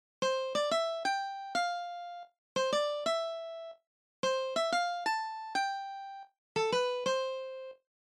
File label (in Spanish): Guitarra Real